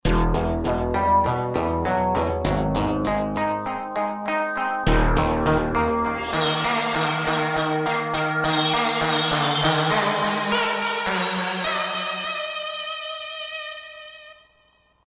Digital Rezo, Roland JD-990; Factory Patches

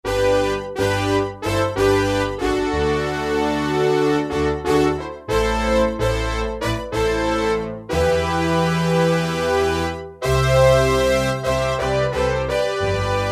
Rich Brass, Roland D-50; Factory preset demos

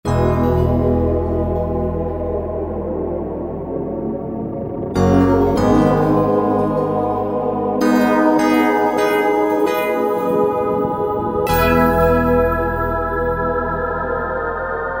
UHMM Keys; Generalmusic S2R

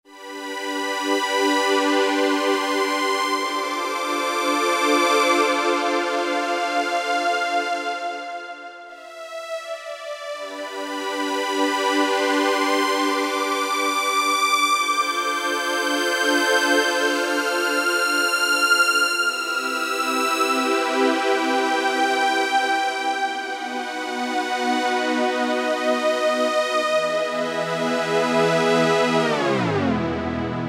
STRING MACHINE 1

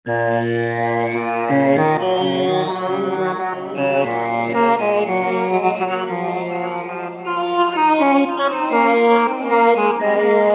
Roland V-Synth Thinband Vox; Factory Patches

